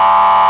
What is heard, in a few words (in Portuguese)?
buzina Ar Policia